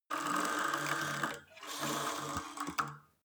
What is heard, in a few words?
cable machine old pull release, fitnessstudio